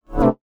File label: GUI Swipe